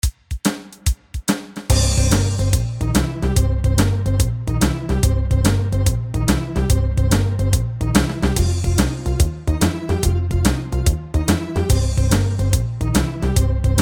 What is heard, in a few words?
Blues Backing